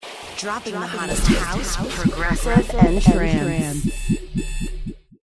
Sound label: electronica sweeper